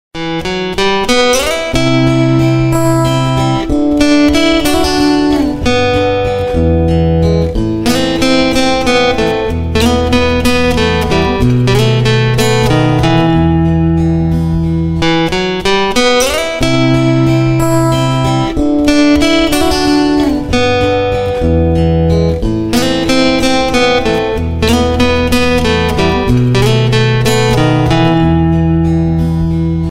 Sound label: Ringtone guitar